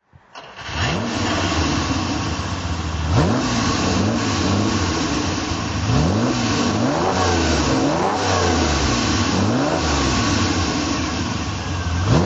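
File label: ferrari 330 throttle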